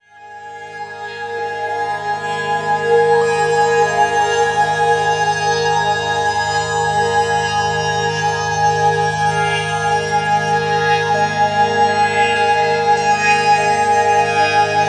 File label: Bright Space, Pads; Sonic Foundry (Sony Creative Software); Slow Silhouette